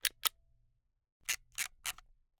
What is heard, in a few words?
Camera, Kinusa K77, 50mm lens, plastic, snapshot, wind film; Eiravaein Works; Vaeyan IV